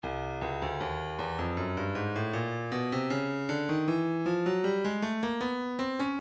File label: breakbeat 078 bpm; Stylus Breakbeat Midi Files; perturbed